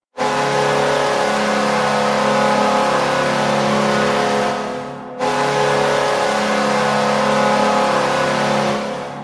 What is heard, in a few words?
Goal Horn; Sabers